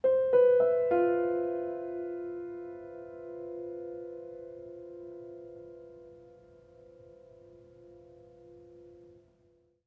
Music Level Argument Stinger; Florence

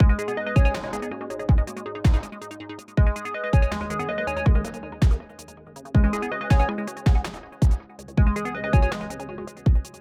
electronic menu music loop

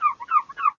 Gosling Idle

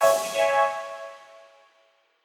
Quasar Clear B

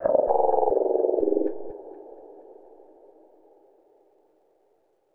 Flytrap Overlay Dark

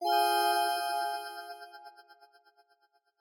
Clear Ab2db High; Mixolumia

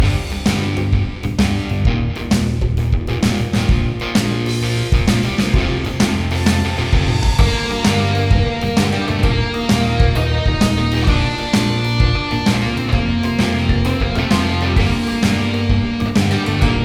Boss Victory Loop; Super Dungeon Bros

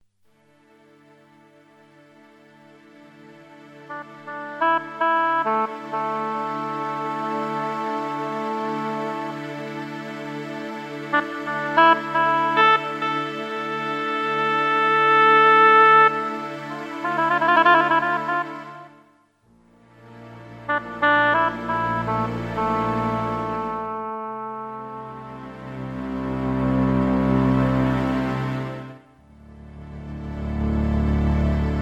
Slow; Blood Lace: A Gothic Novel

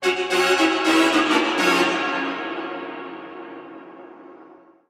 Music Stadium Event

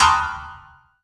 break piano keys; Stranglehold; PS3; Xbox 360

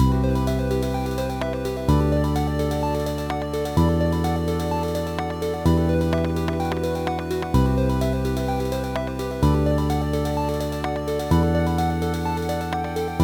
Lose Results; Creatures of Aether

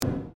Attack Dodge

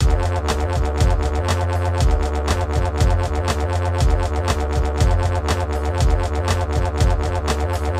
Clockwork Machines C Ethnova

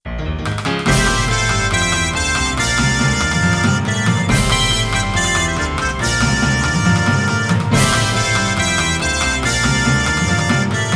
Victory!; Seven The Cavalry of Molmorth music collection vol.2 2011

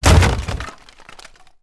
wood break; teardown ps5 windows xbox series xs gamerip 2022

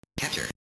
Captured

